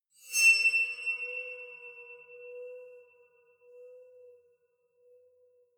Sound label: aquaphone fast